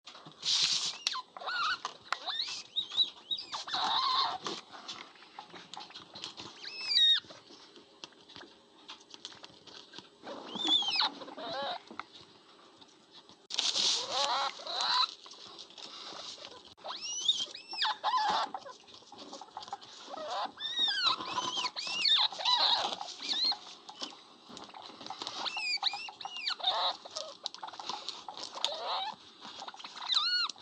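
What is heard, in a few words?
tushkanchik golos, jerboa voice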